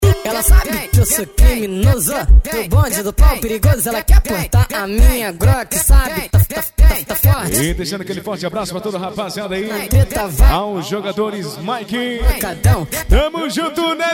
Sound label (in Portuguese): funk pisadinha sertanejo